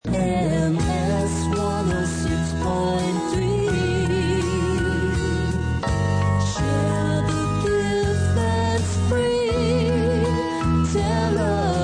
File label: Jingles; KYMS